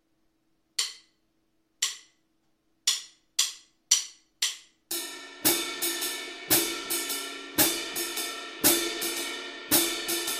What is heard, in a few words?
swing laid back